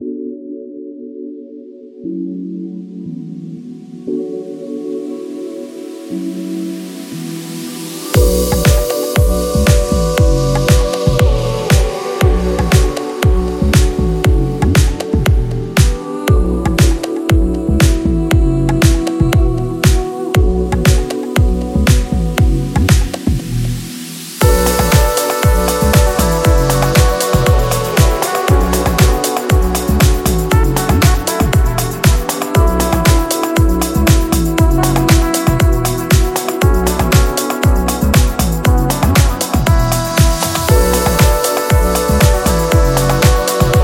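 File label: Luxury Style, House background music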